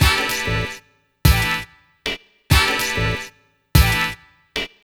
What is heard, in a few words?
Horn